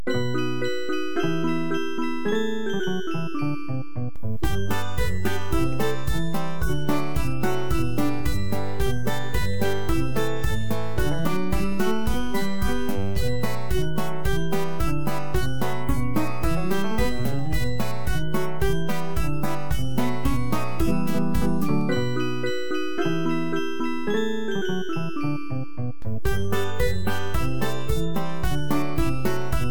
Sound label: instrumental